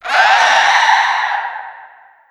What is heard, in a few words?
scream; hallow; dota; conquest